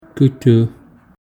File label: knife